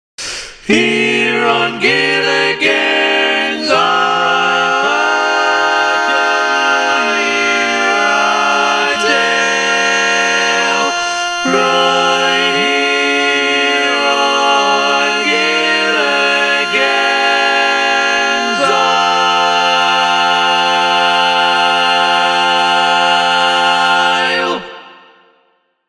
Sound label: Bass, barbershop tag